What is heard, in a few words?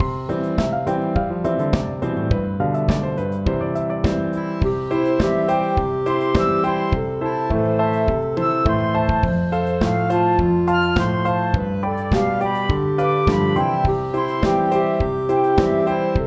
Pop Song; MTWFC VOL2 Parts1&2 Audio Accompaniments